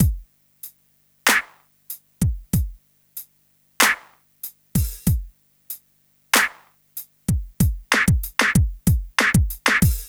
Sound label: Smooth, R&B Drums